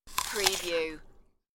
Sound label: Apple Bite